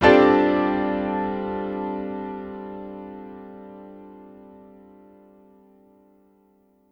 Piano stack (D) min; Sonic Foundry (Sony Creative Software); Ugly Remnants VOL-2

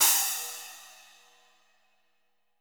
SPLASH, WARM BALLAD; Spectrasonics; Burning Grooves CD2

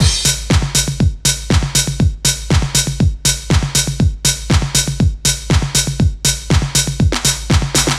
4 floor beat, trance

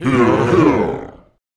VOICE FX, Dance Vocals

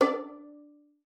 FING D5, VIOLIN; Xsample VOL-5

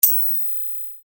Hat, EuroDance; Best Service; Ethnic Journey Sound Cube; EuroDance Essentials

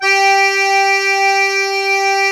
DIATO1 G3, Historical Instruments; USB Soundscan vol.23

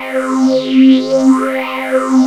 3100 FLM C#3, Korg PS3100; Back In Time Records; Korg Universe VOL-1

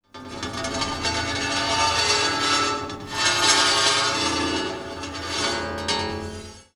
Delayed, Wire & Tin, contact mic

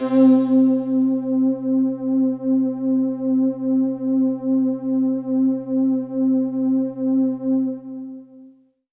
Pad C, Synth Prophet One Shots; Electronisounds

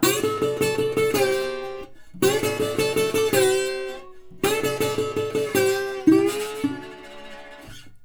120, Blues Guitar; ILIO - Fretworks

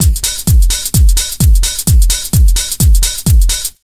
BEAT, Disco House; USB Soundscan vol.20; Fresh Disco House I; AKAI

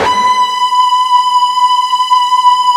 FUZZ, Guitar; ULT FZZ LOOP; East West; Steve Stevens Guitar Samples Collection

